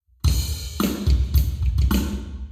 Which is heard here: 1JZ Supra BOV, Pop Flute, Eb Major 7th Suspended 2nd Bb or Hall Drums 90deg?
Hall Drums 90deg